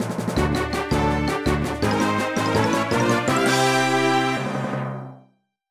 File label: Battle Win; Fossil Fighters Champions; Nintendo DS